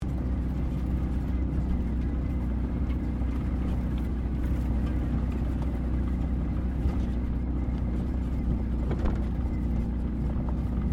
CAR NISSAN BLUEBIRD DRIVING CROSS COUNTRY, beat up old datsun; Car Nissan Bluebird 1983; Cars; Vehicles